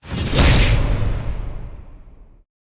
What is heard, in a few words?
bossfight menu transition; superheroes2